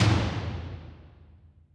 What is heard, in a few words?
perc